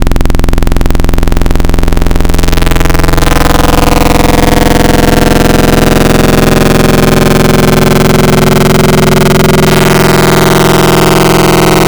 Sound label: Late Harmonics, FM Wavetables; Cymatics; FM Wavetables Vol 3; Serum